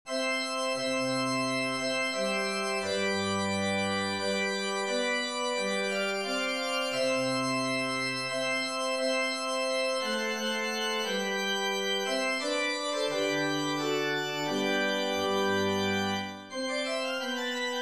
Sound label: Cornet Voluin C